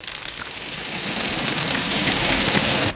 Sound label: gritty sand pouring onto hard plastic; dirt-sand